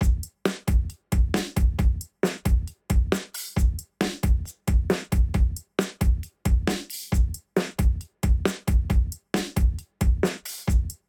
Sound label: Jrock loop 135